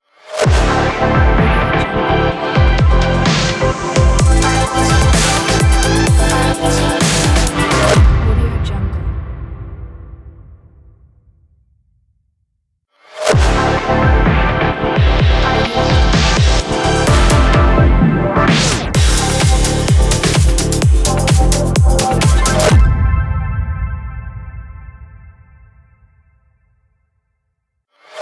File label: summer dubstep logo